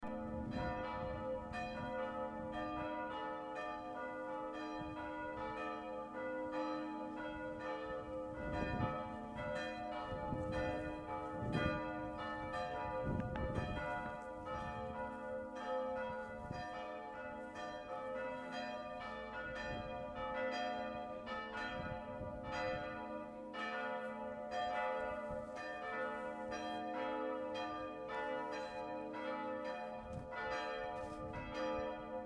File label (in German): glocken nitzow